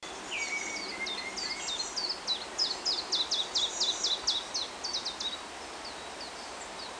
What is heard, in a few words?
collybita start; Draycote